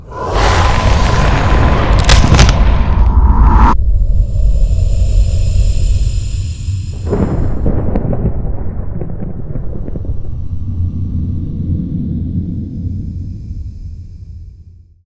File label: death; Necromancy